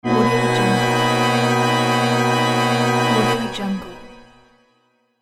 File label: game over; astralaudio